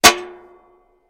Frying Pan Impacts; pots & pans

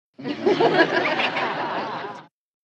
Crowd Light Laughter, medium

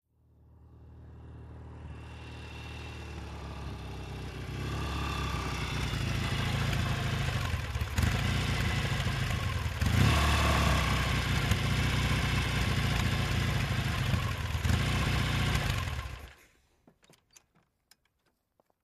Mcycle Norton Medium

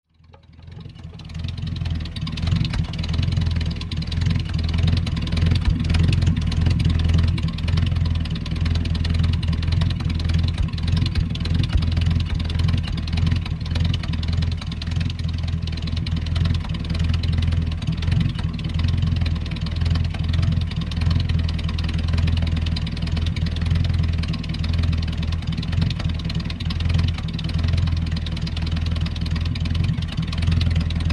1949 Dodge 3/4 Ton Pick up

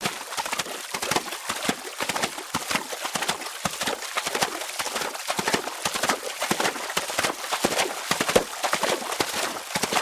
Horse Gallop Thru Wa

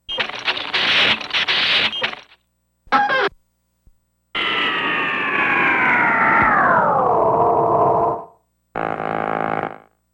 Squeaks Pops Burbles

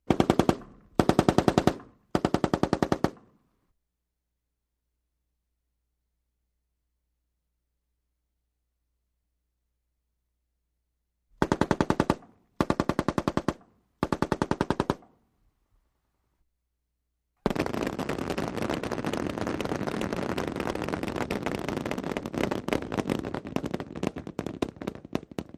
Multiple Weapons, m 16

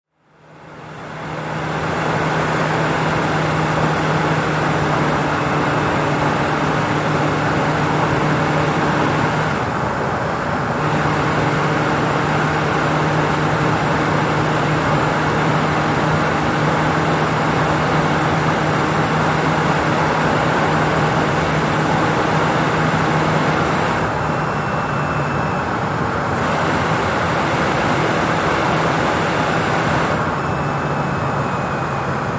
Sedan Accl 00 Mph Slo

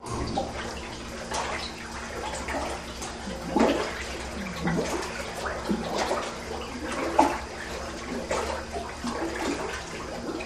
Milk Stirred Lrg Tan, splashes

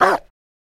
Rottweiler Bark

